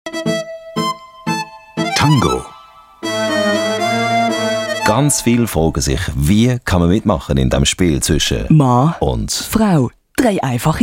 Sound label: Tango